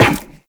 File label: Run Zipline; Footsteps; Warframe; Tenno